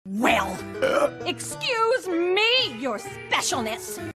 well burp excuse me your specialness

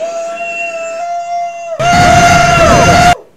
woo; dj khaled

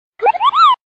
text alert tone ringtone; final fantasy; moogle; mognet; whatsapp

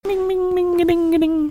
ring ding

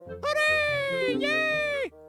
hooray yay; wind waker

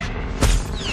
blaster

